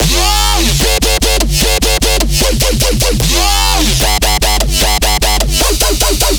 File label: Drop Loop 150 BPM G Min, Dubstep, Bass Loops; Synths; Cymatics; Dubstep Starter Pack